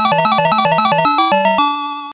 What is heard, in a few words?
Level Start; Ninja; master system